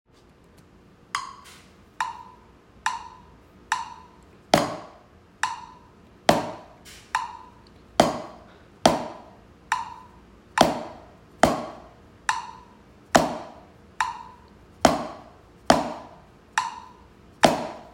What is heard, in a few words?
quarter, drums